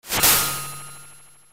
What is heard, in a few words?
death toll; ff3; ff3us